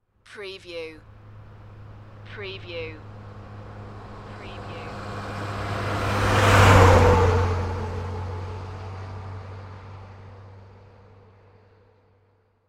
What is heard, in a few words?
Commercial Truck